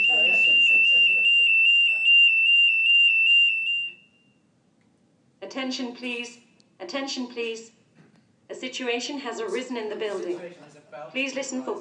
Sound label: vague alarm